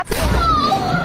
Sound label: kid slap oh